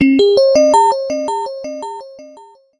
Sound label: Alarm